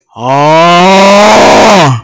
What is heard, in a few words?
augh